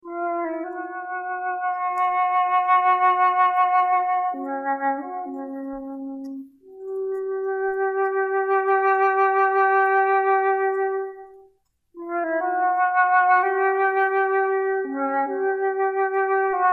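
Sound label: flute in the forest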